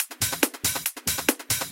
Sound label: Loop 140 BPM; vengeance essential club sounds-3